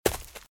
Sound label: Footsteps - Leaves - Run - Right; RadwinIsland